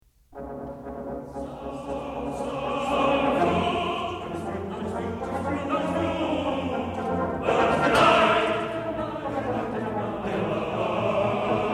Sound the flute!

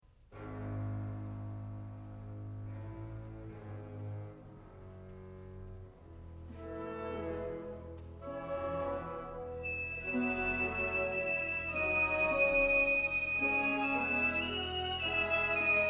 Tempo di valse rubato

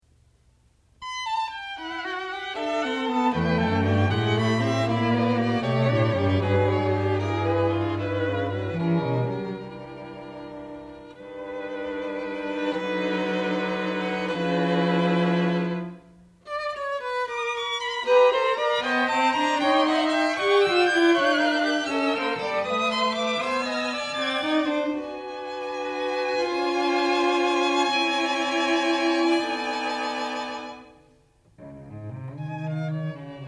String Quartet; String Quartet No. 3, Mosaic, v.

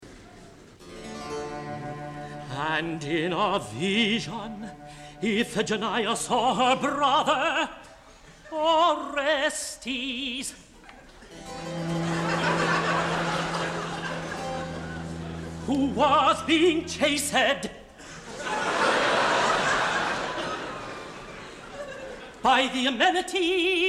Recitative

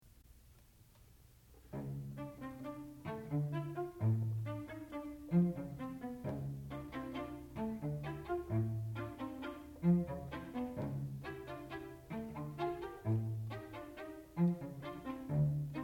String Quartet No. 3, Mosaic

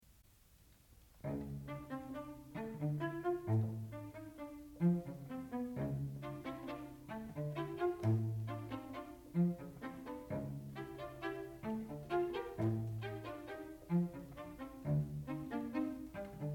String Quartet; String Quartet No. 3, Mosaic, Iii.

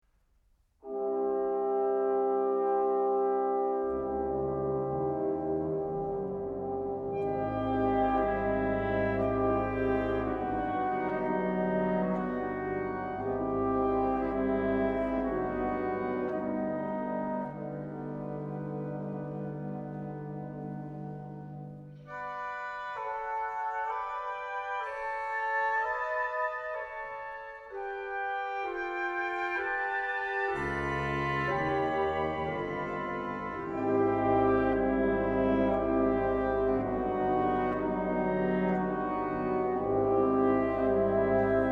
Folk-tunes from Hardanger; 100 Folk-tunes from Hardanger, Op. 151; Suite No. 5; Troll tunes; arr. S. Nordhagen

